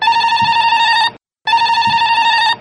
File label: Floor Phone; CBOE